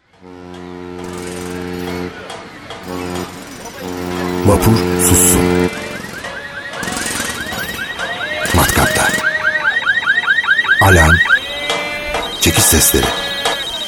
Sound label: STREET